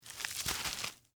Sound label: Rope Grip Twist, Handle, Heavy, Rope; Hand Tools; BlastWave